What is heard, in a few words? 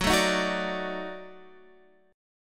Eb Minor Major 7th Flat 5th Gb, chord